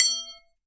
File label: Aluminium Tube Drop